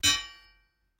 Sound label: large sword hit; soundscrate